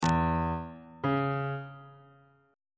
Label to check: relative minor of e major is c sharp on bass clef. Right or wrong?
Right